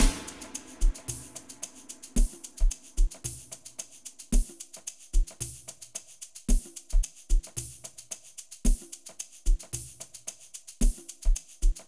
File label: Groove